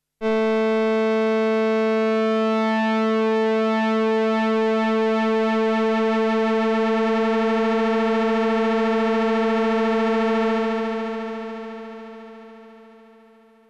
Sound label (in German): Detune, Während eine Taste gehalten wird, wird der Detune Regler über das ganze Einstellungsspektrum einmal gedreht.; virusc